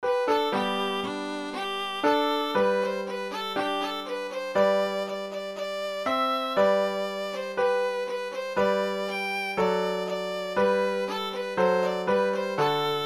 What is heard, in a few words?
violin